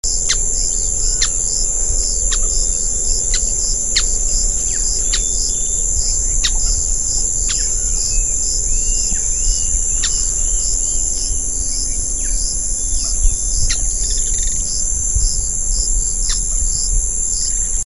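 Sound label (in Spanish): curutie colorado, aves; cantos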